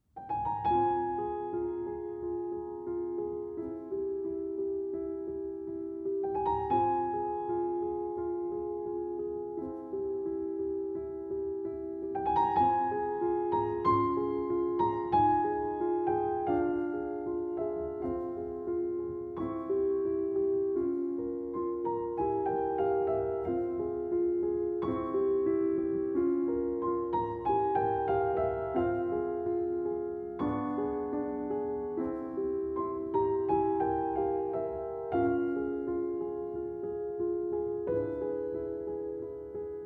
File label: Version for Piano duet